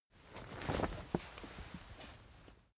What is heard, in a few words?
camping chair rise